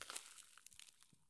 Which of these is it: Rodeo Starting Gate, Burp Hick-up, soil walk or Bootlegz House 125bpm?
soil walk